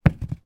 industrial sealant tube drop on carpeted floor

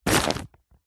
bag sports small pick up; zapsplat

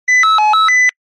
technology CB radio call ring tone

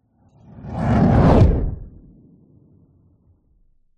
nature fireball pass by designed

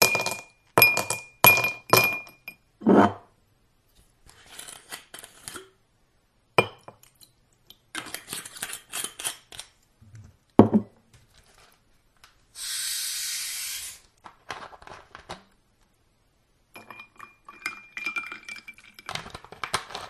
pub vodka & coke sequence, field reports

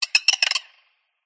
squeaky metal Designed Alien Bird; PMSFX

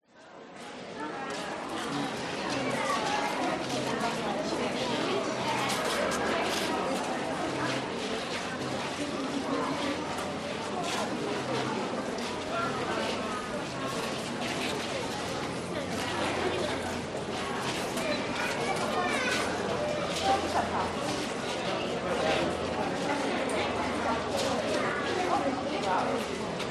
Finland Market; audio hero

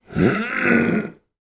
human male vocal strain short

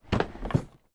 household under bed storage tray push under bed

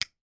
industrial tools pliers rubber handled clamp; sound effects one

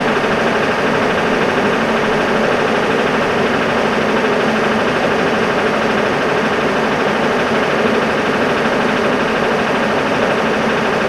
industrial machinery motor room small; sound effects one

